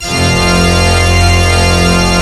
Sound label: ENSONIQ FULL PIPE ORGAN